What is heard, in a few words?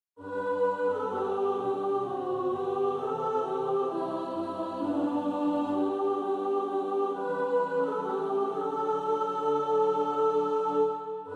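soprano, practice music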